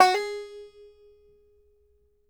BANJO NOTES, AB4; Sampleheads - Whole Lotta Country 1 & 2

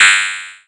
DSP Hit; Sonic Foundry (Sony Creative Software); Twine-IDM

